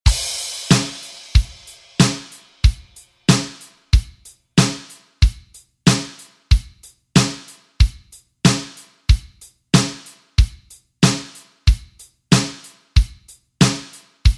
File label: 80s ReDrum; DJ Mhark